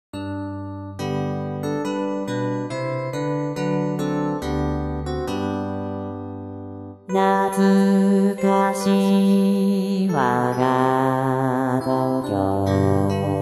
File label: chor-b